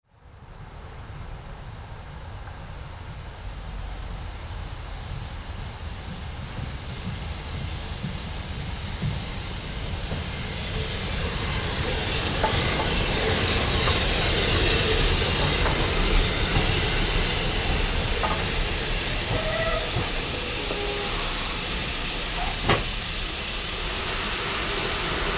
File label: Steam Train; Haworth, West Yorkshire, UK on 17th February 2025; Cesar Gimeno Lavin; The wonderful steam train arrives at Haworth station